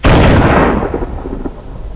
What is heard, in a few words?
lightning